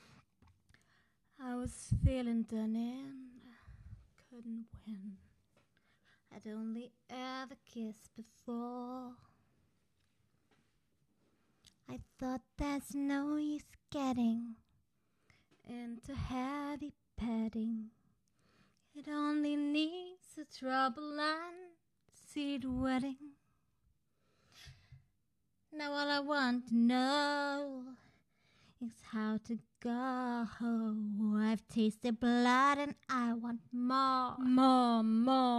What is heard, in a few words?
BAD SINGING